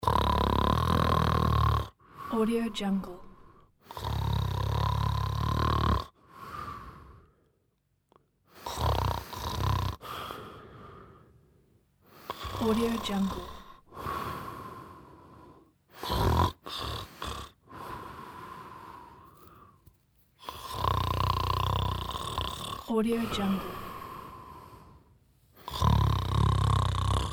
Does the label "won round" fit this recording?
No